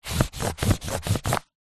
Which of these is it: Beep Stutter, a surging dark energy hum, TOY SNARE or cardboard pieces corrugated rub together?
cardboard pieces corrugated rub together